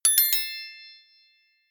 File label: notification; samsung Aleart